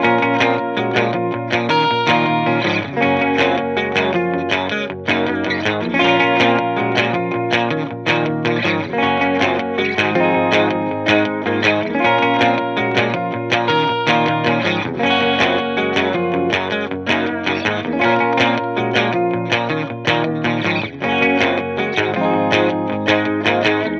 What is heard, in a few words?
guitar loop 160 Bbm; Pop; Guitar Stacks; SD PASADENA Pop Sample Pack; Slate